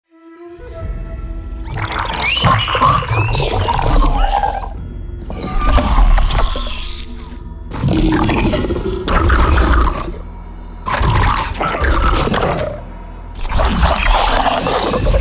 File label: Monsters & Creatures Sound FX; beastiarium showcase